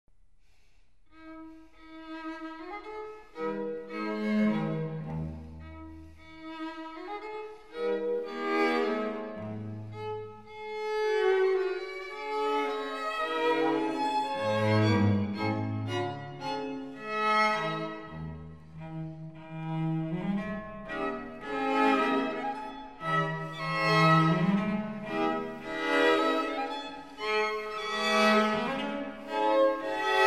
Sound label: quintet; forster e